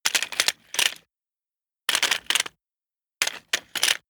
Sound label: metal handling mechanical click